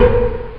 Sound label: Factory noise; gcompris; memory sound